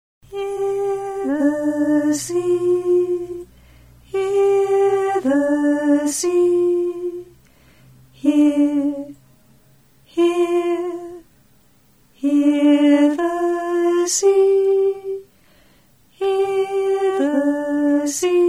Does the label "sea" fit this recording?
Yes